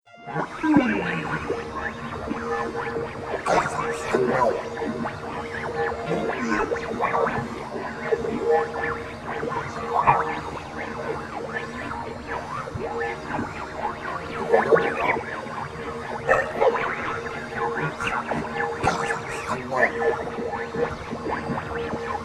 Soundscape Mothership Interior, science fiction sound effects